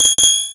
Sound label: ding ding; sonic cd